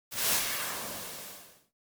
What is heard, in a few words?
Swipes; Electa Quinney